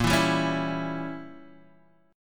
Bb Diminished 7th Bb Guitar Half Step Down, chord; chord shape

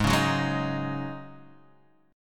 G sharp 7th Suspended 4th, Guitar, Half Step Down; chord

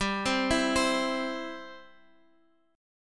C Major G

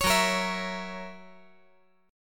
F sharp 7th

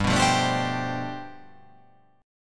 G 11th

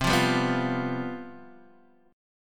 C Major 7th Suspended 4th, C, chord

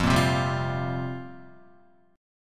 F Suspended 2nd Suspended 4th F down Guitar; strummed; JGuitar

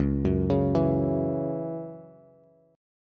Db Minor Double Flat 5th Db Bass; arpeggiated; Dbmbb5; JGuitar

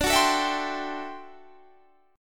D 11th D, down, Guitar, Standard; D11 strummed; JGuitar

